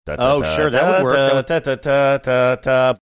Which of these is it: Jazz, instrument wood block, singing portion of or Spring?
singing portion of